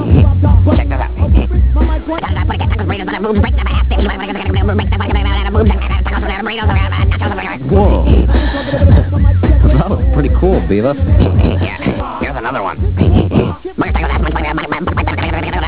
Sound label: rap; Beavis and Butthead